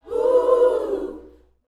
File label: YOUTH CH PHRASE, Vocal Planet Gospel